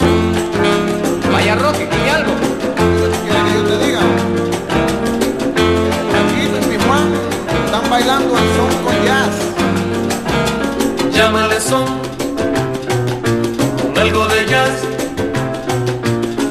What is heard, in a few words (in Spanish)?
SON CON JAZZ, boogaloo